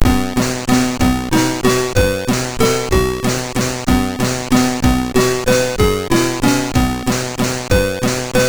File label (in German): Folkmusik, MOS6581R3